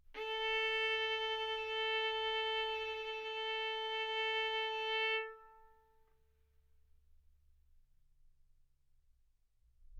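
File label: Violin arco mf A string A4 B4 stereo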